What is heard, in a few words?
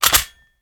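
pistol reload empty charge; gmod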